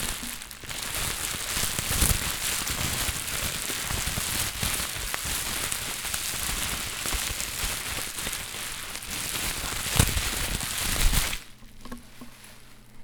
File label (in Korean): 으이으이